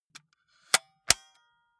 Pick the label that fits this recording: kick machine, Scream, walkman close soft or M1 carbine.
walkman close soft